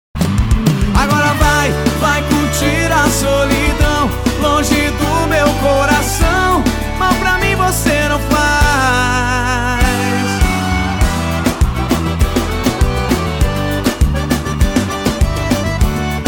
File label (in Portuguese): arrocha sertanejo